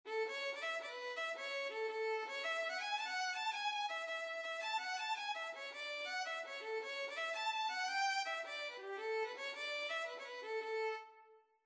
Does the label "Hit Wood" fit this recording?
No